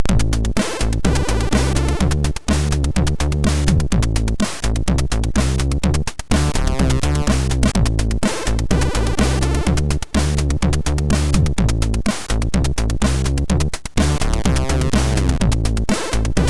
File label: Jingles, sid MOS6581R2; Deek